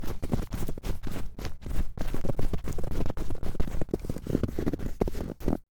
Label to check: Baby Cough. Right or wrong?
Wrong